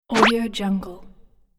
boing jump swoosh

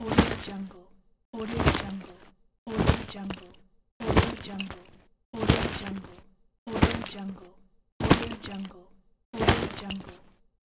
soldier gear equip

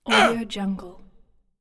male pain; stormwaveaudio

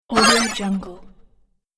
whoosh pickup bonus

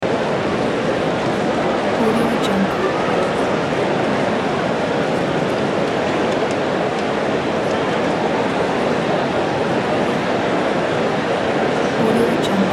intermission tennis stadium ambience

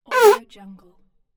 fart

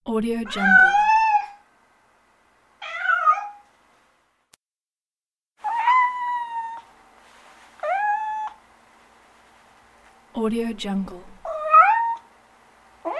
meowing of a domestic cat

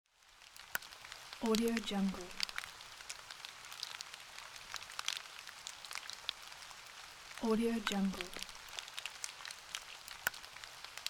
light rainfall

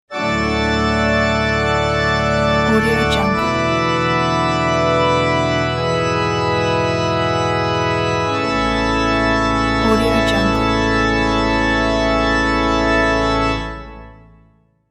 worship logo

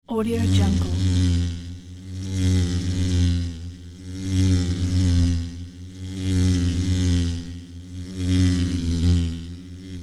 cartoon bee buzzing around

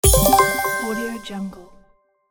success

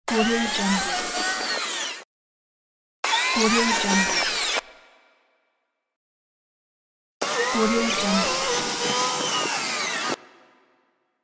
rewind

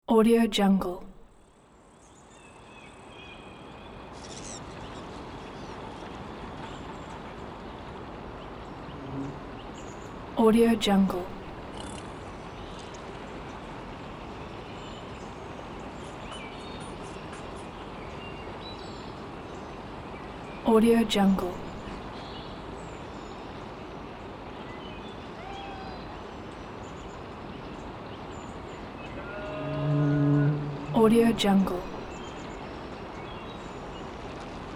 suburban ambience

